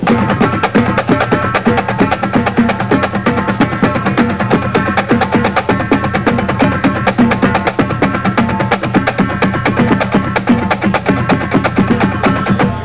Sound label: Accent taps- BD playing an accent tap excercise.